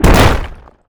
rock impact spike trap; Infernal Radiation; PC, Switch, Linux, macOS

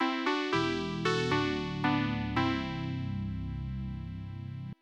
Title Jingle; Burning Dragon Plus; PC-9801; OPNA

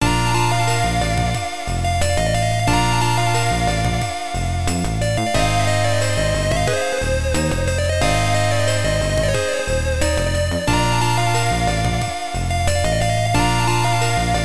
Painful Sigh; Miwaku no Chousho; PC-9801

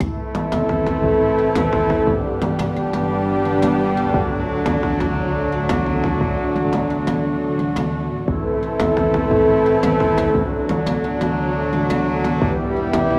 Dialog Crafter Loop; skykeepers; ps4 windows xbox one gamerip 2017

